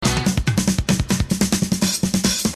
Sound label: drum